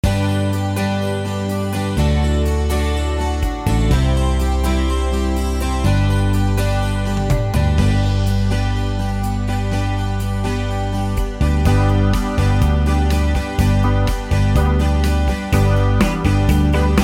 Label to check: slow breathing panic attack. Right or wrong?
Wrong